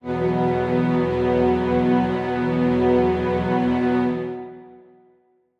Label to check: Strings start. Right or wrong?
Right